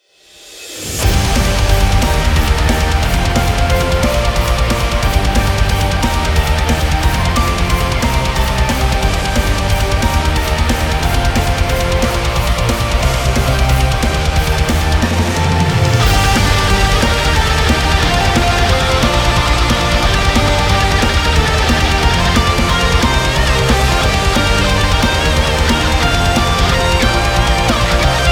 Instrumental

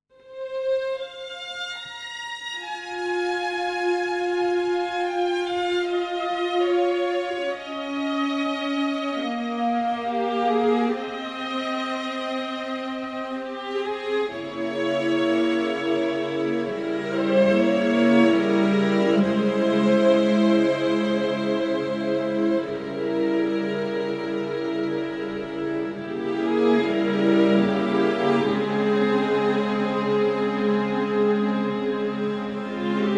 Smaller Strings Version

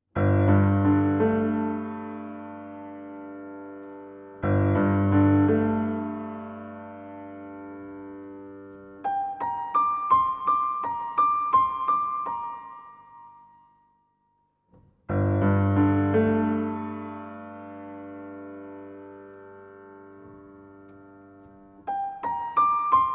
Mysterious Theme Solo Piano

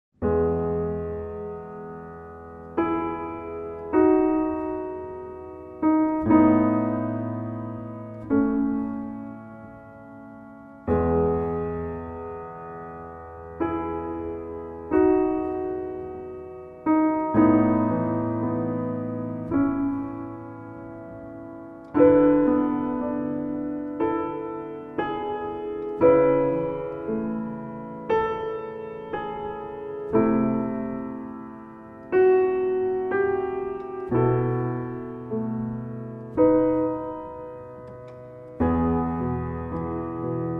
Solo Piano

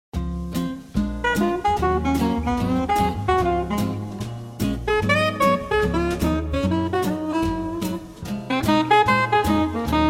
Happy Music Vibes

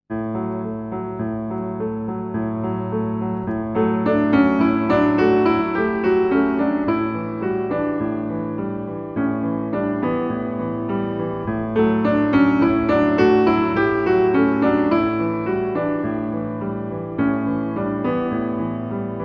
Seascape Piano and Strings

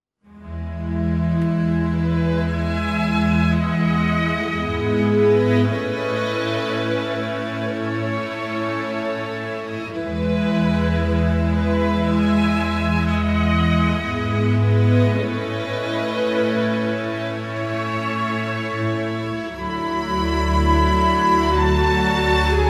Orchestra Only

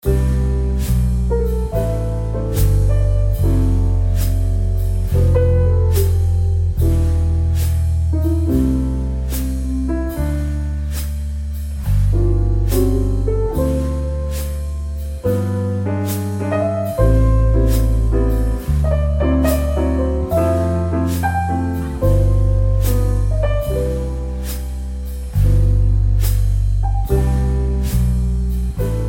Coffee Shop Jazz Relax

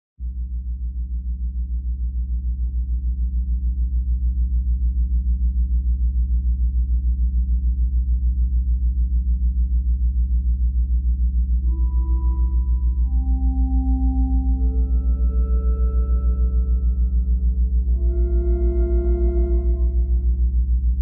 Organ Drone

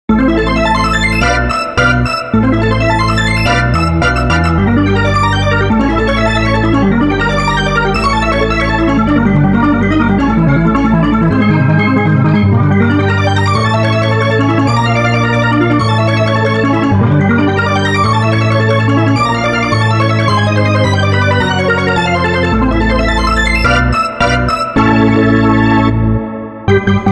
Serpent Organ

